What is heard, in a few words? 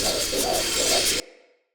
Dagger Throw Perfect Loop; Weapons; Player; Swords; Fey